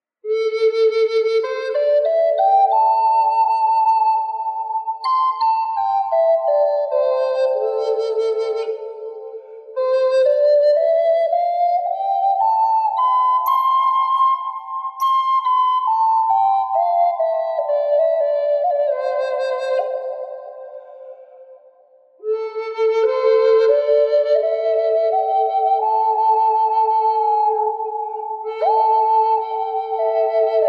a m drone wet, flutes; birds eye; drones

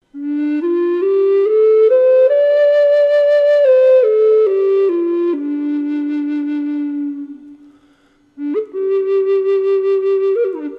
Dm Scale and Riff, eastern aromatic cedar flutes; standard flutes

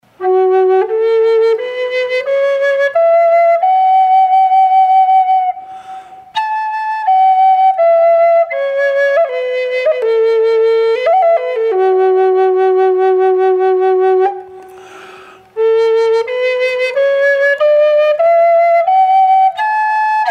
F sharp m wet, flute

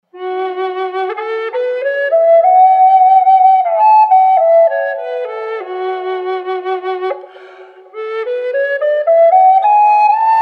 F-sharp spalted maple wet, flutes; spalted maple burl

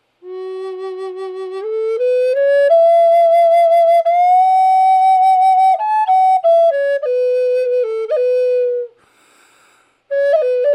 ebony f-sharp dry, flutes

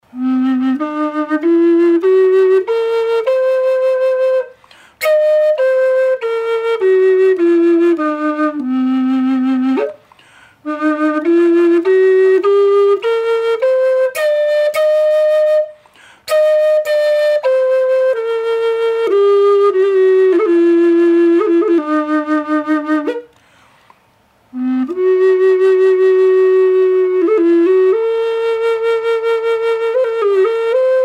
Cm dry, flute

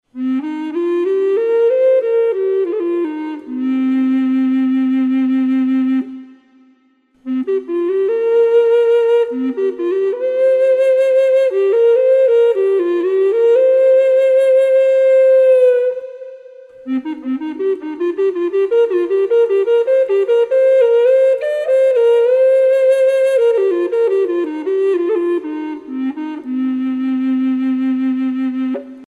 bass c, eastern aromatic cedar flutes; standard flutes

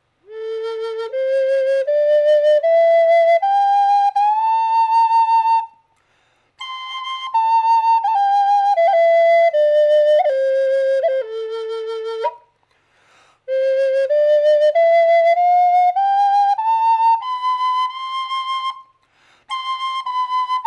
staghorn sumac a dry, flutes

